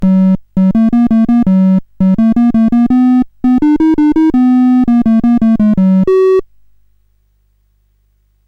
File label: CSG8580R5, GAMES; Sammy Lightfoot